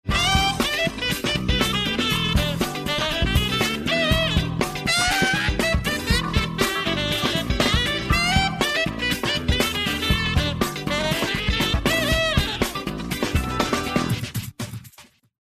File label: start action